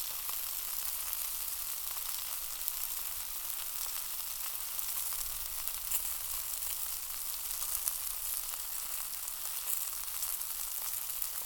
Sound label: Grill Sizzle